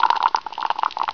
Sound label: Rolling Dice; PC; MARIOSFUNDAMENTALS